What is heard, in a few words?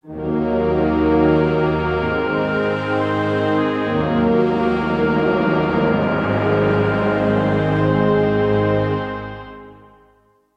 Music; TR4; FMV Intro